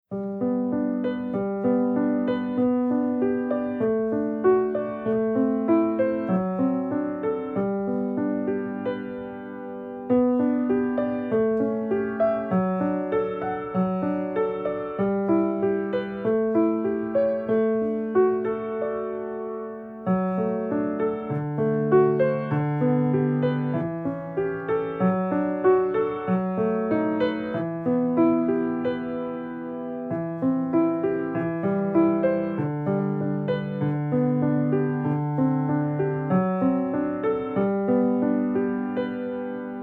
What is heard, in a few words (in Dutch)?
Gebroken akkoorden met pedaal, Piano oefeningen